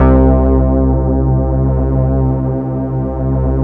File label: SYN, Analog; Sound & Vision; Gigapack I CD 2